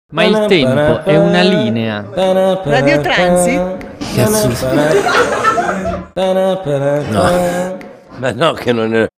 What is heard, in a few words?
jingle